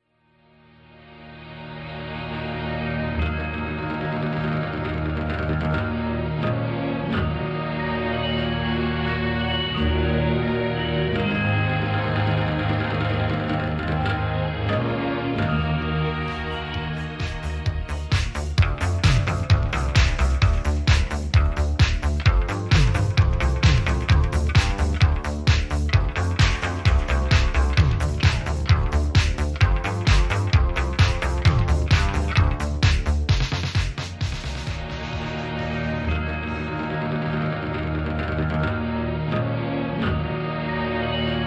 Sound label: bassline